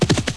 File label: galop; DAoC